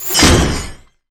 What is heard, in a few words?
Arrow Metal, Damage